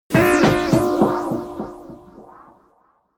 Scifi Audio Logo Ident, Sudden, Echoey, Wah Guitar; Lynne Music